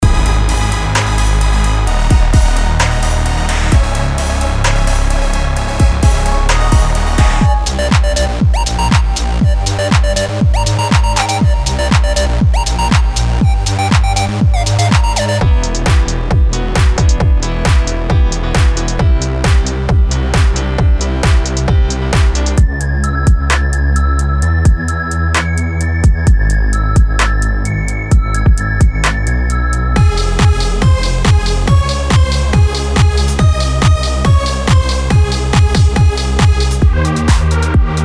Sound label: witch house; witch house sample pack; kvass lab